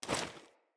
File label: Female Foley Action Default Melee Swipe